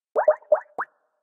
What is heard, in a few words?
skype for linux call dialing